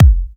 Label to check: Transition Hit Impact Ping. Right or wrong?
Wrong